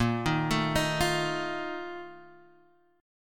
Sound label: A sharp Major 7th Suspended 4th, down; arpeggiated; JGuitar